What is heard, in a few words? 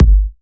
Bassdrum